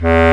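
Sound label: bass clarinet E1; Proteus2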